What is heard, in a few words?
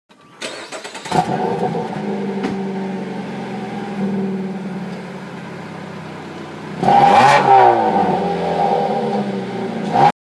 2015 dodge dart gt exhaust